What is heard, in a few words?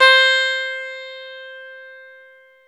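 E 12 String guitar; Club-50 - Foundations Roland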